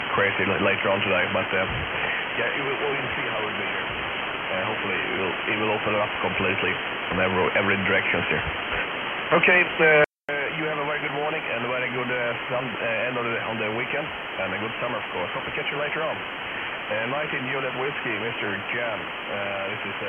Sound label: CB Freeband 27705khz USB